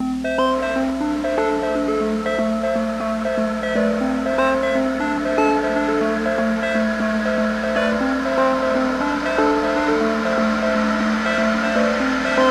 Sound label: Radio - Night Level Chill; bugsnax